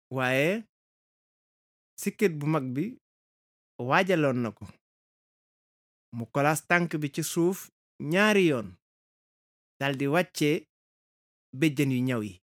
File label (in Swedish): bip